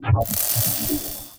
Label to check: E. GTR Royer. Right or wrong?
Wrong